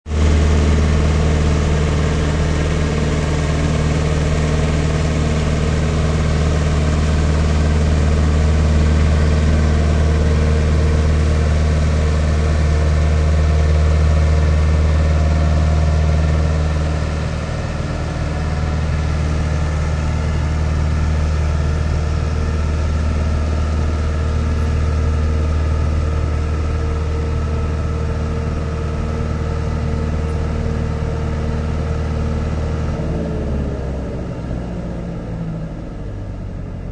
Leaf Collect, Huge leaf collector truck sucking leaves from curbside; Truck Leaf Collecting; Vehicles